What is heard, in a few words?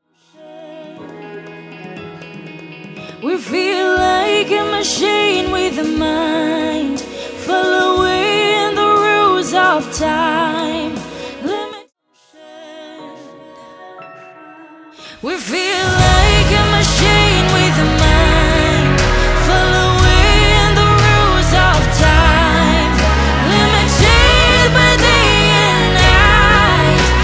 horror